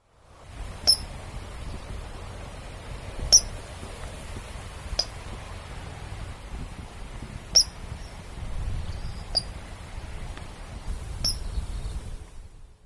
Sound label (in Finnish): nokikana; varoitus; linnut